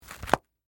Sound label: Police Badge, Wallet, Flip, Close; Emergency; Flick Sound Effects